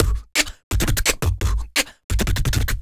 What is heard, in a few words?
Human Beat Box, Drum & Bass; Loopmasters